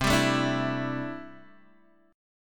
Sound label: C 6th Add 9th, C, down, Guitar, Open G